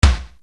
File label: RX7 PROC BD1, Yamaha RX-7